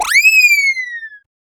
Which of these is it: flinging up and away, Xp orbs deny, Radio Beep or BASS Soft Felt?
flinging up and away